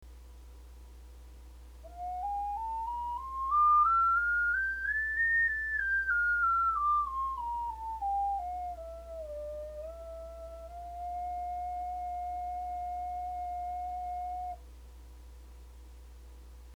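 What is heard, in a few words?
ocarina scale; オカリナ; Ｏオカリナのソノグラムと録音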